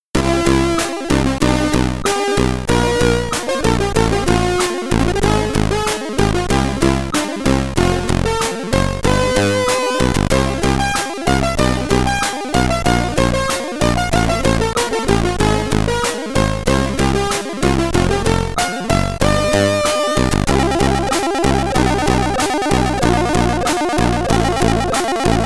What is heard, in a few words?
High Score, SID Stereo, Commodore 64; Fuzzball